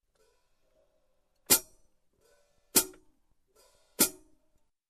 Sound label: Foot Hat